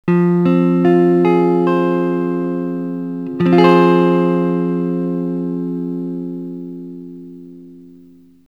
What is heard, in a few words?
f minor, guitar